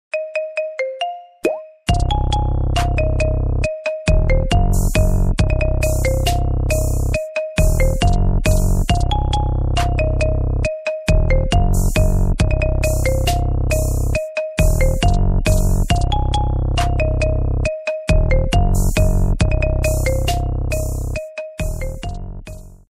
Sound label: beat marimba cover marimba ringtone